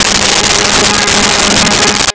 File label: Strings Ab3; Nintendo; July 2020 Gigaleak